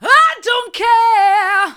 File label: i don't care hard, vocal